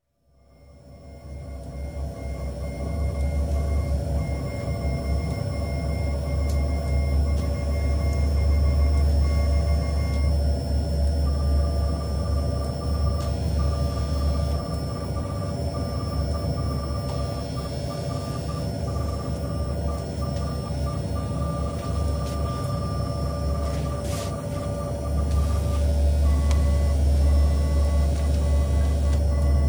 Car Ferry Bridge, with morse code, RT & intercoms security announcement; security announcement (at 1m33s); Car Ferry St Nicholas, 17,400 tons, built 1980; Boat; BBC